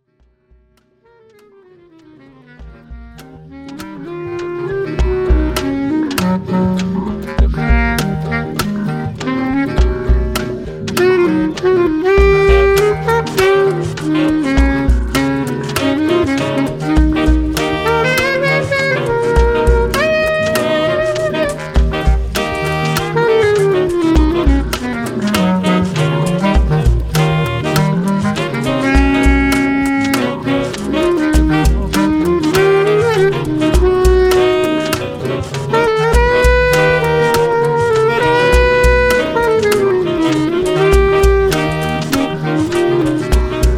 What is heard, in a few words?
Brushes